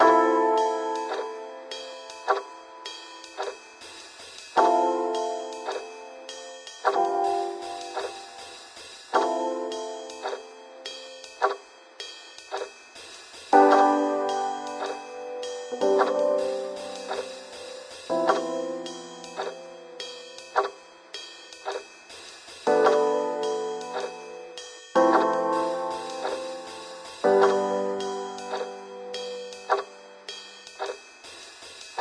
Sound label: Smoking Room Loop Piano; Ether One Redux